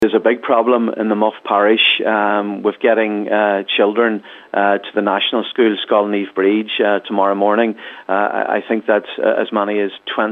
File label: pads